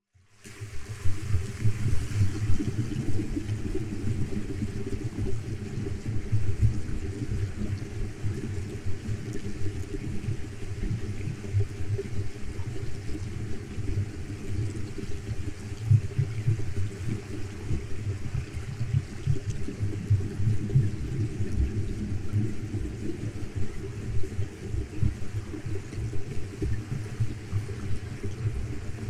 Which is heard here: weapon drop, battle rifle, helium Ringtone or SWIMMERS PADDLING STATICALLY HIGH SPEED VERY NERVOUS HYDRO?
SWIMMERS PADDLING STATICALLY HIGH SPEED VERY NERVOUS HYDRO